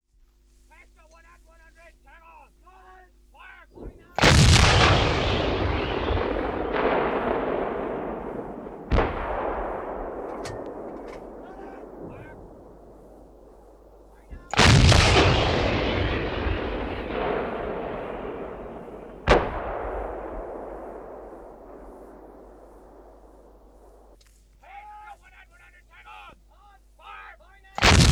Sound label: Sound effects, Chieftain tank Firing